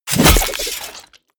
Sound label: sickle hit smash